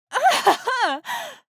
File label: female exasperated yell